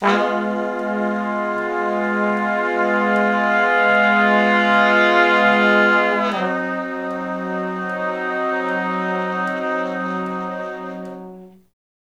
Slo Blues Chords [IV, IV, I ,I] (Ab), Horncraft for R&B; Sonic Foundry (Sony Creative Software); Sections